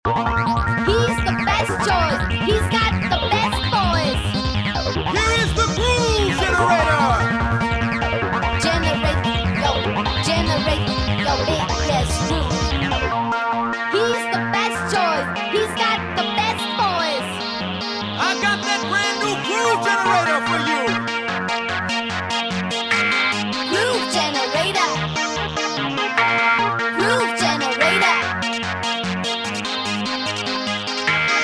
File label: groove generator